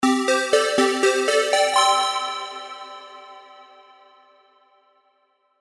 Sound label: jingle; mikamusic